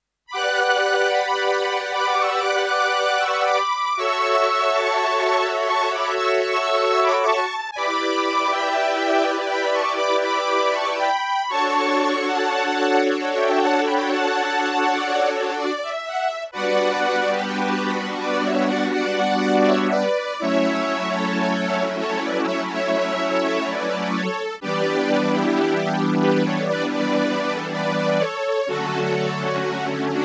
VC340 Strings Mono Stereo VP330 Stereo

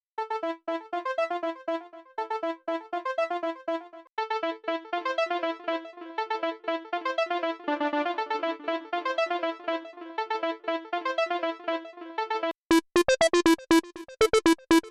Sampletank Leads

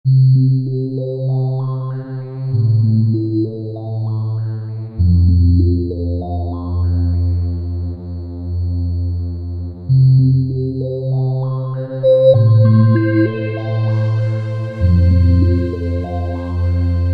Slicer

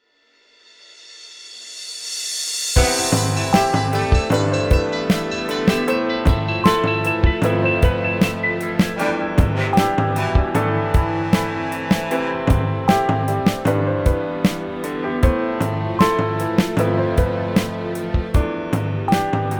Ambihop

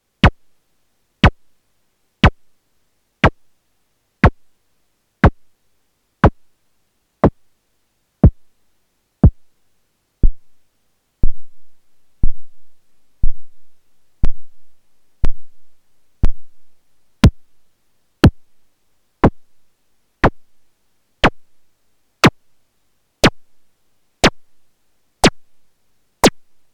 Fold Drum; Erica Synths Perkons HD-01

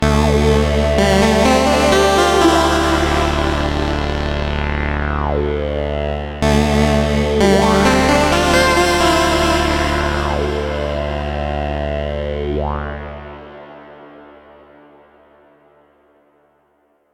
Waldorf Streichfett Plug In; Forgotten City

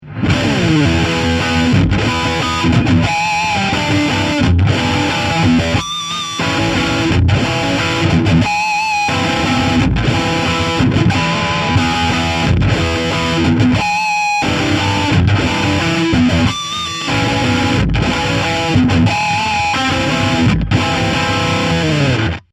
Dragon Lead Bridge

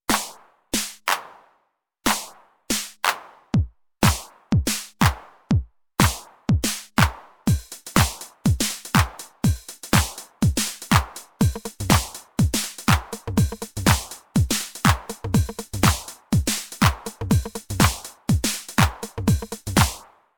TR8 909 Snare Clap phasing

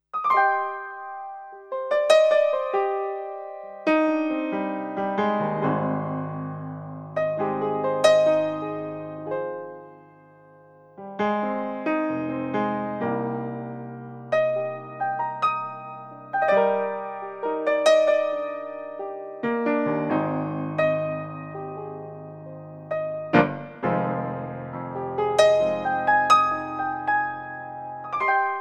Piano

